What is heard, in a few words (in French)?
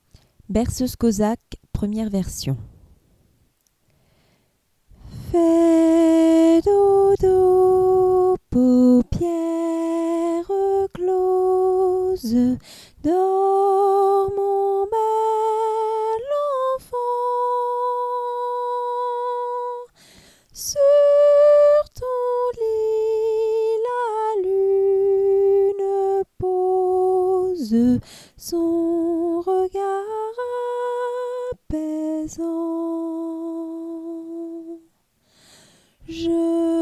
berceuse cosaque version soprano